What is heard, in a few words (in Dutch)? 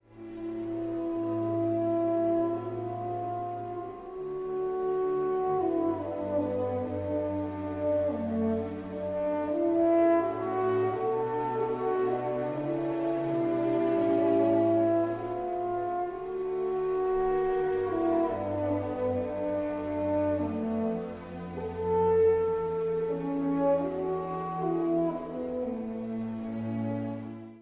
hoorn karakter